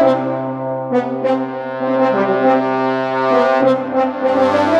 Blackthirst Brass, brass

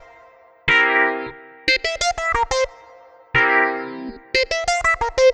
Ambient Keys

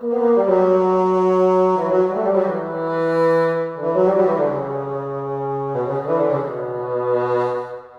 Solo Bassoon

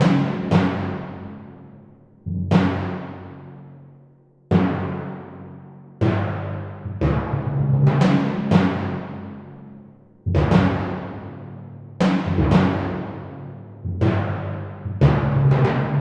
Timpani Drums

